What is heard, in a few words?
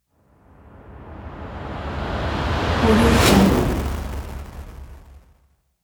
interference rise; audio-atomy